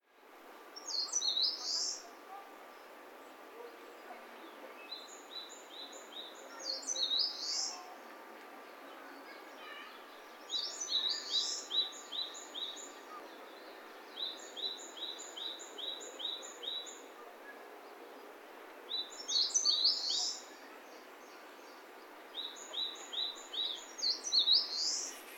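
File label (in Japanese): センダイムシクイ; さいたま市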